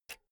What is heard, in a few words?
pull out jack cable; objekte; elektronik